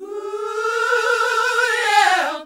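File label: Gospel; Best Service Gigapack 1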